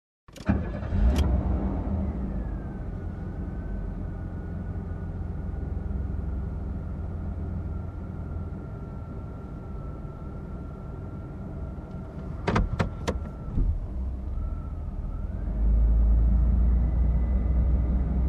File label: Car Drive from cockpit